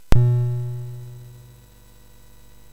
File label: Frantic, MOS6581R4